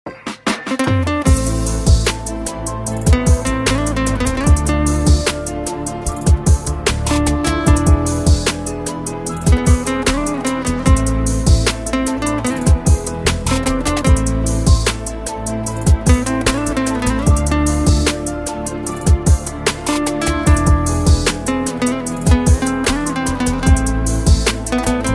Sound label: guitar cover